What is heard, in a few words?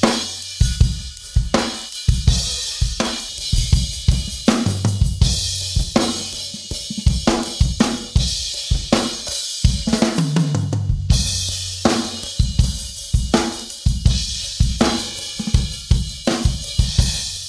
drums pattern